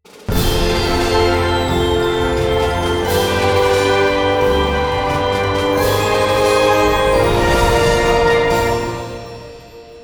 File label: freespin Win; netent